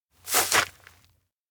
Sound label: steps outdoor grassland half step back; anemoi